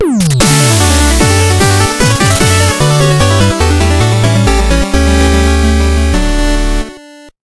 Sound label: Music Card Win; gamerip; shovel knight king of cards